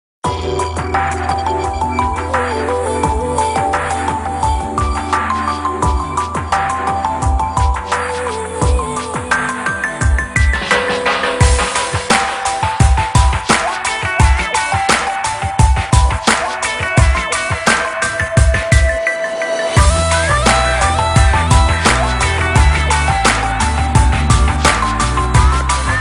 MUZIC FLUTE RINGTONE; QMOBILE

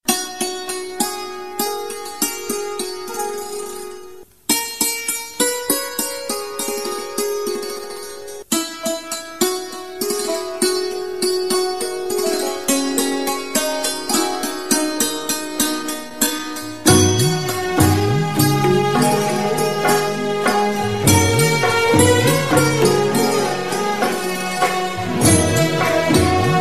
INSTRUMENTAL RINGTONE

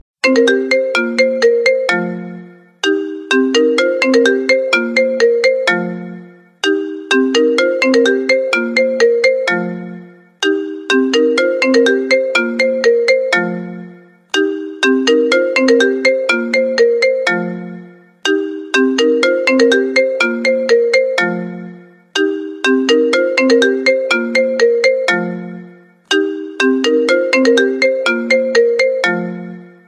IPHONE 9 RINGTONE

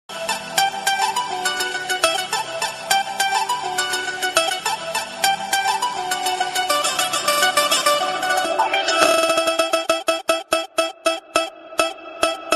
EDM RINGTONE; PEAKY BLINDERS

